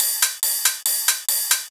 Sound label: Drum Mix, 140 BPM; Vengeance Samples; VTDS2 Song Kits; Female Take Me Away